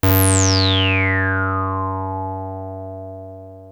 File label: 303 F#2, TB 303